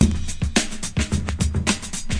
108 bpm, drum loops and breaks

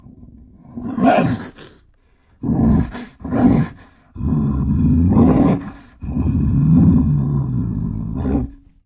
grizzly bear small growls; Zoo and Nature

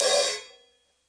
crash choke soft, drums single hits

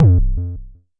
Jomox MBase, drum machine; Jomox MBase 01

